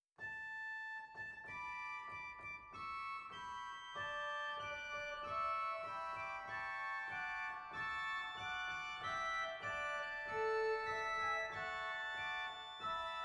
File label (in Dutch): Borstwerk Superoctaav 2